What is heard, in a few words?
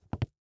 FOOTSTEP Leather Wood Hollow Jog